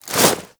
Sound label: Manic Jump Foley; Warframe; Grineer